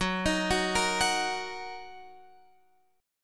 Gb 6th Gb down Guitar Open F; Gb6 arpeggiated; JGuitar

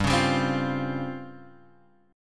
C sharp Major 7th Flat 5th, chord

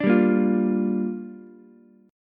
G Minor 7th, Ukulele; Gm7 strummed; JGuitar

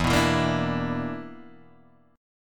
B Minor Major 7th Double Flat 5th, chord

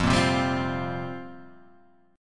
F Suspended 2nd Suspended 4th F down

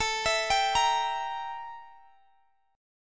E Diminished

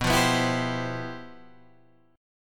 G 13th B, chord

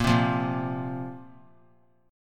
A sharp Suspended 2nd, down; strummed; JGuitar

